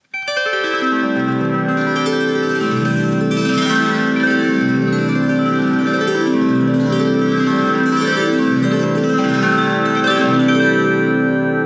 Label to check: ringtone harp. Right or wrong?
Right